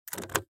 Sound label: Key Open Vent; Granny 5: Time to Wake Up; android gamerip 2022